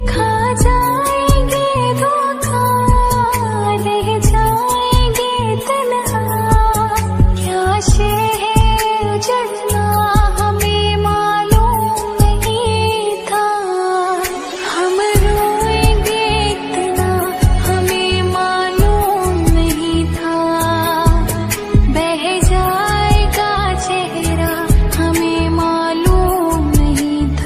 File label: Sad Breakup Song Ringtone